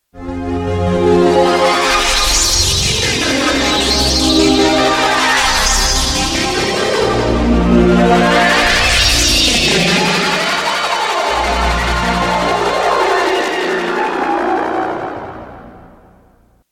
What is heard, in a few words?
Pad Weird; micromonsta2